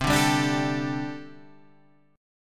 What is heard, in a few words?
D Suspended 4th C, guitar; Dsus/C; strummed; JGuitar